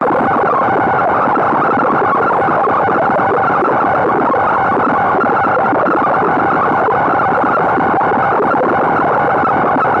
science fiction scrambled message unscrambled tone loop; Skyclad Sound